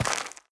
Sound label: step light, footsteps, player, dirt